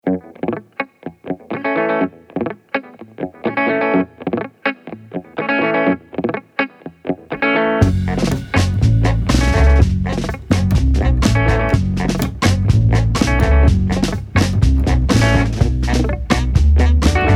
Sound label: siren call instrumental